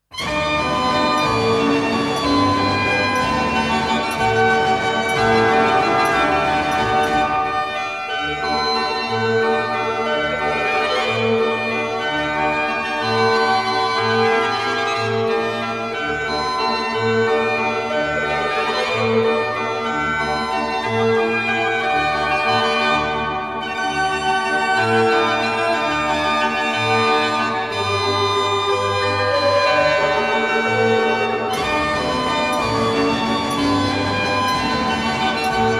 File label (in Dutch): draaiorgel